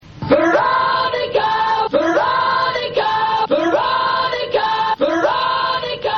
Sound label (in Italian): loop80; r veronica